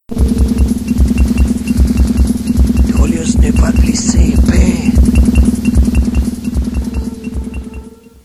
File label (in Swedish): jingel